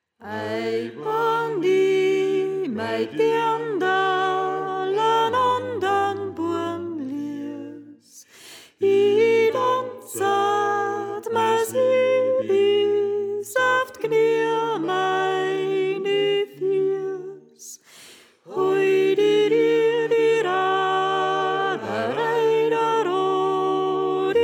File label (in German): Sopran; Ei Wann I Mei Deanderl